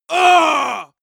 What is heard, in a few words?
male combat hit hard; soundpool